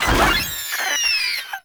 Maggot Attack; Enemies; Vocals; Warframe

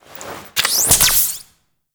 Heal Ability Cast; Warframe; The New War; Drifter; Abilities

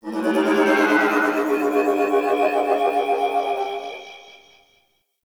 Ship Exterior Creature Calls; Warframe; Corpus Ship Remaster

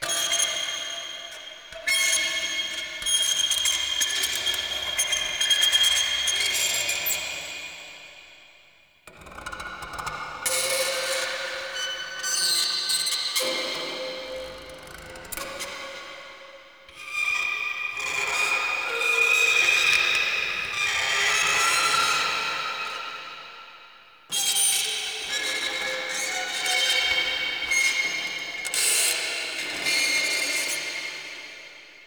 Metal Scrape Loop; Warframe; Shadowgrapher; Interior

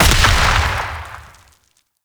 Proc Explosion Max; Warframe; Mods

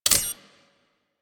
Blastor Pistol Charge Finished; Warframe; Weapons; Corpus

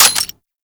Mech, Lever Action Pistol; Warframe; Grineer

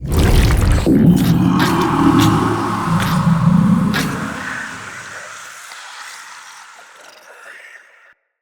Stomach Enter Stinger; Floppet; Bromius Quest; Neathuns

